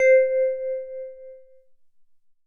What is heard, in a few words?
Oberheim OB-8 PLUCK Bell Low - C; Synth; One Shots; Cymatics; Fantasy Synth Sample Pack